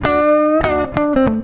FUNKY, Grooves

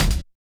kick, Hardcore Breakbeat; Big Fish Audio; Hardcore Breakbeat Action; Warned

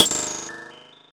HH CLOSED, Disco House; USB Soundscan vol.20; Fresh Disco House I; AKAI

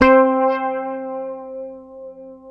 Fingered bass C4 L; Sampleheads; Will Lee Bass Library

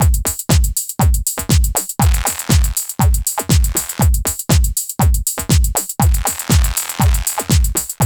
GHET ROL; Best Service; Advanced Media Trax Building Blocks; GHET ROLL

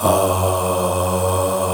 POP AAHS

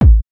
KICK, BASSDRUM, Techno 138 BPM; Best Service ProSamples vol.54; AKAI

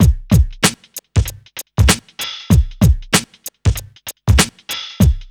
RNB 96 BEAT, Hip Hop; USB Soundscan vol.34; Burning Grunge; AKAI

